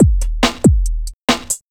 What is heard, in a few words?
Beat, Drums, Eclectic Electronica